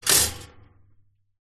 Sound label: household toaster modern pop up